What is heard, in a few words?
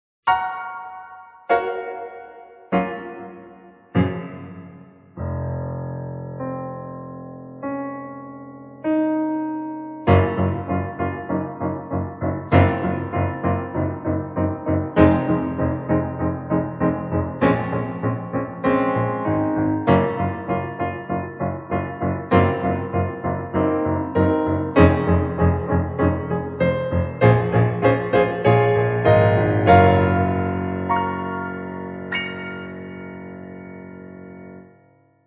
Buz Room Reverb